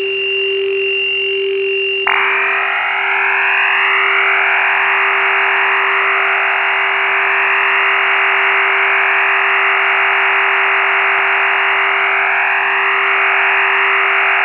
IDLE, Bulgarian diplomatic duplex 39-tone PSK waveorm; BUL 39-tone modem in idle condition; BUL-DIPLO 39-TONE MODEM; PSK-systems